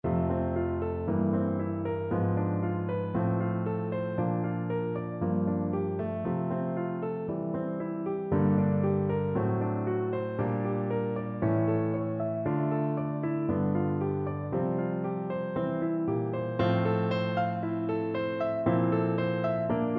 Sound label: Romance, piano